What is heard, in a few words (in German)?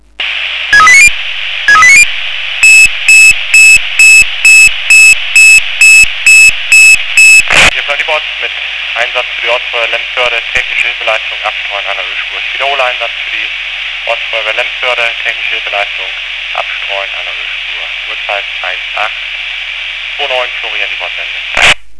Funkalarm, Feuerwehr